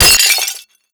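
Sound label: destruction pot break; Explosions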